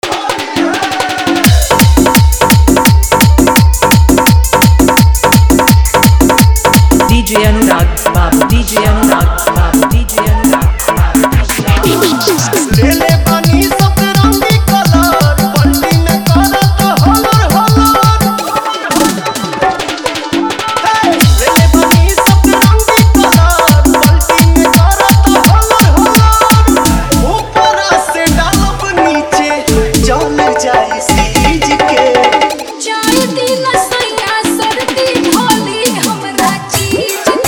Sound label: Dhollki Bass Mix